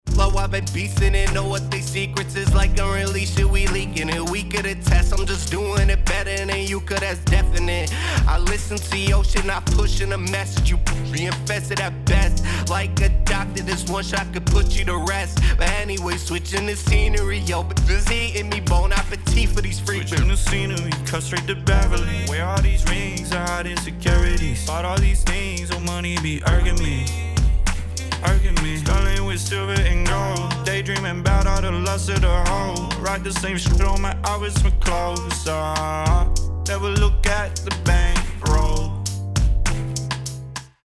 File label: Hip Hop Mixed